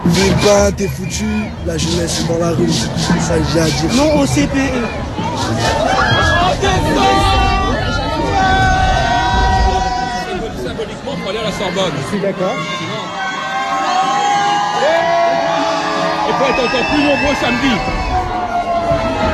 Manif anti CPE Paris ambiance

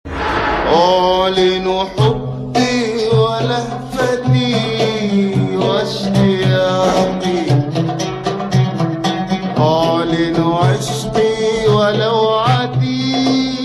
Chant arabe

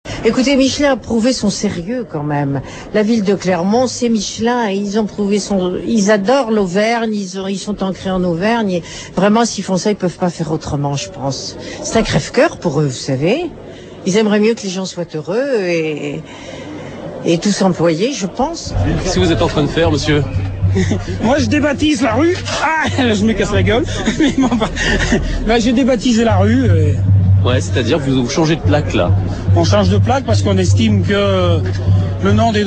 Manif ambiance; Michelin

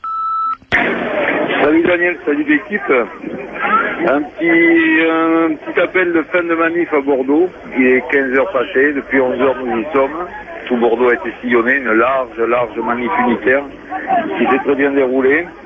manif Bordeaux; Reportable manif 4 oct 05

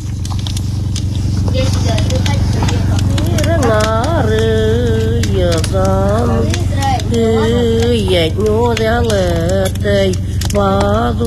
Chant nenetse

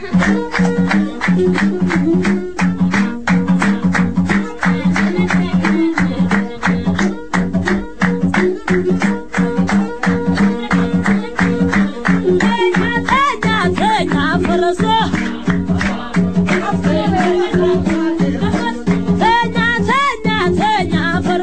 Chanson chanteuse Azmari

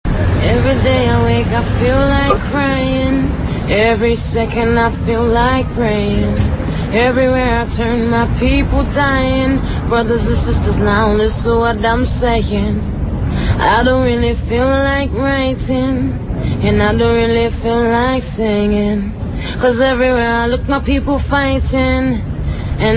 Chanson US engagee

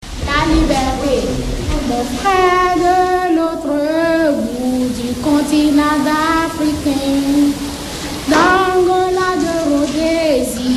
Benin chants enfants

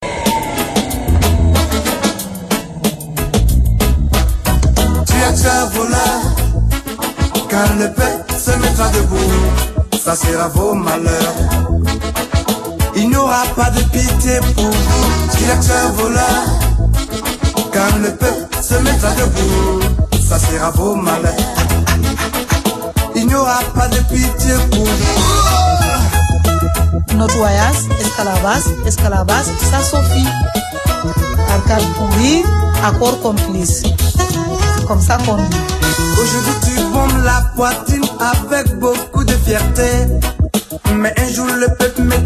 Chanson Reggae

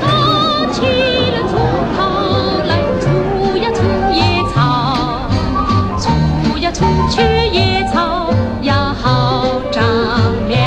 Chanson chinoise

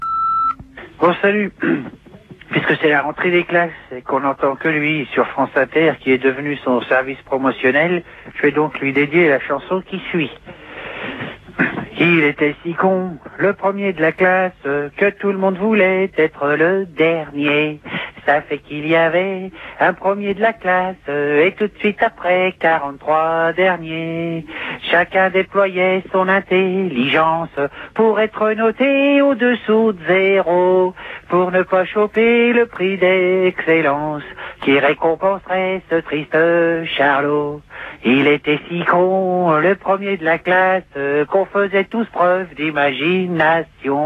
Chanson repondeur; Sarkosy

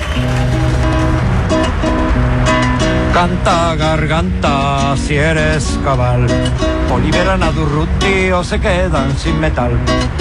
Chanson

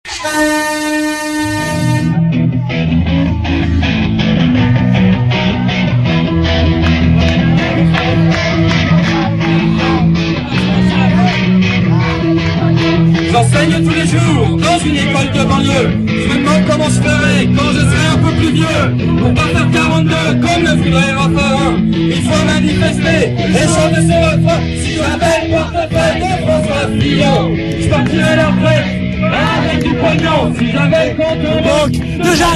Manif profs chanson

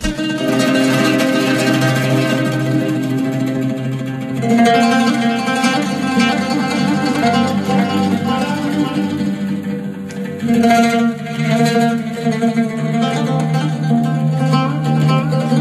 Musique

